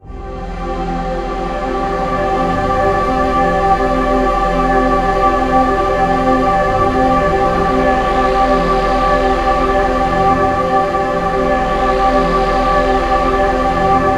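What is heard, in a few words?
soundtrack, ethereal atmospheres; Soundscan - Ethereal Atmospheres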